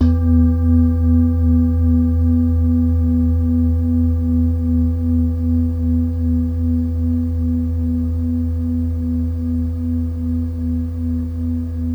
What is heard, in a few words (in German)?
klangschale gross